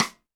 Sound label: Snare, Roland TD-20